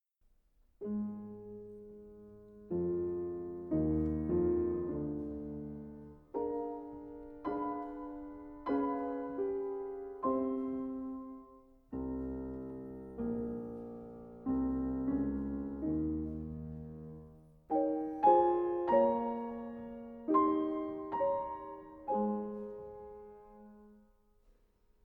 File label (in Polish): Chopin koncerty single piano